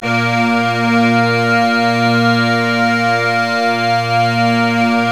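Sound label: Scottish Bagpipes A1; NorthStar - Global Instruments VOL-1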